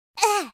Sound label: crash; Ginger